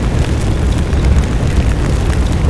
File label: fire large